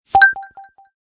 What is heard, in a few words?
Jumping; Pantech Vega Secret Note 2